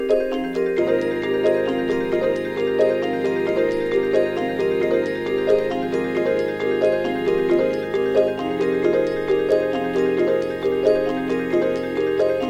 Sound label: Music For Mallet Instruments, Voices & Organ